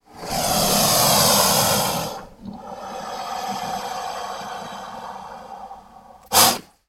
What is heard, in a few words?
Alligator Snort Brea